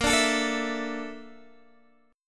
A sharp Major Sharp 11th, Guitar, DADGAD

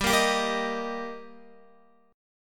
G Minor Sharp 5th G Guitar, chord; strummed; JGuitar

